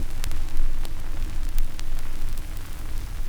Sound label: X CRCK R, RECORD-FX; XP RECORD-FX; Best Service; XXL Production Effects